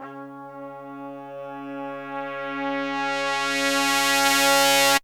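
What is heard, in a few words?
brass crescendo; Zero-G; Phantom Horns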